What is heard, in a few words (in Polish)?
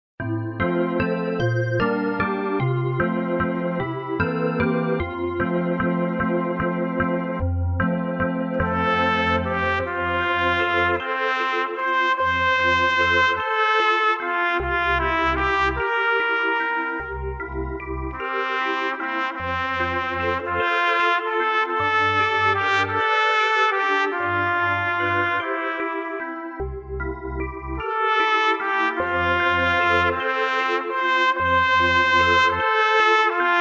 chor z op nabucco 1 trabka